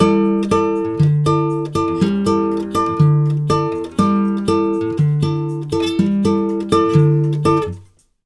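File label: 120 guitar G, brazil bossa; USB Soundscan; AKAI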